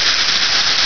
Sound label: Fuse; Effects; Worms 2